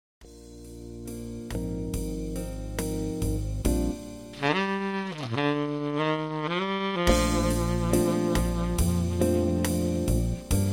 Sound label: subtone, sax